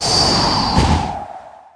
Devil punch; The Denpa Men

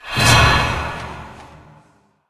Ancient form; Margosa

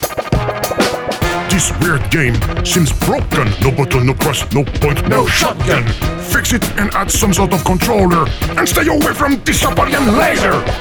Music Rap; Tetris Hint; There Is No Game: Wrong Dimension

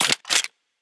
Weapon Reload

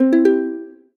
Success Guitar Slow Three Note Dry stereo; xbox series xs

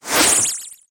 Magic Touch

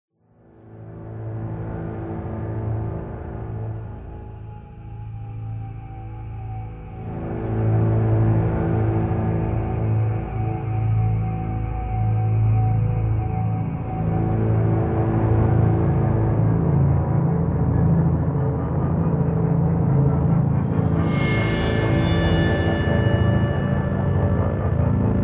Horror Ambience; gamerip; Heavenworld

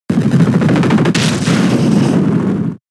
Dig; attack moves; Pokemon; gen 7; SUMO; USUM

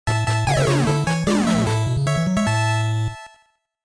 Level Start; q-bert

